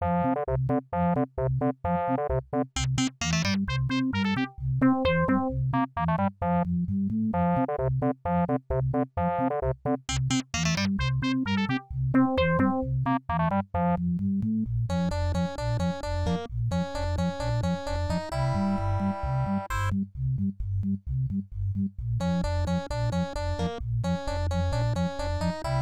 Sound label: Ending; Goofy's Hysterical History Tour; genesis